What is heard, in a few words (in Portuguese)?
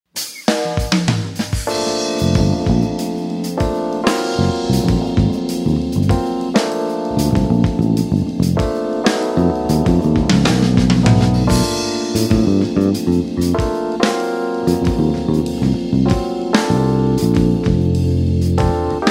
samba